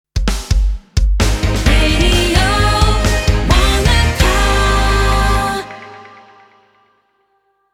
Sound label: Jingle Sting; Radio Wanaka